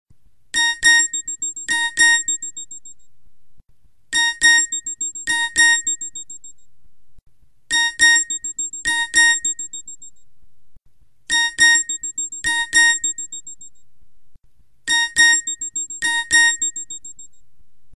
nokia message tone ringtone

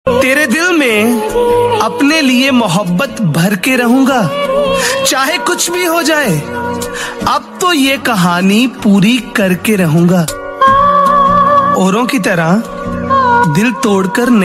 bollywood ringtone

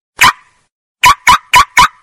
lg lock ringtone

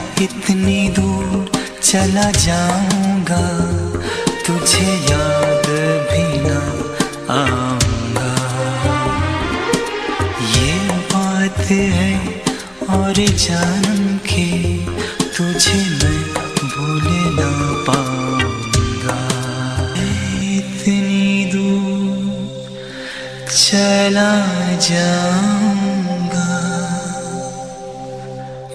bollywood ringtone

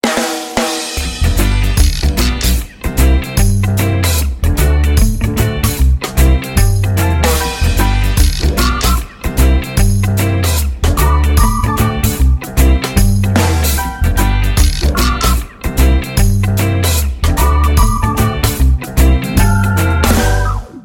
reggae ringtone; junglee; samsung ringtones

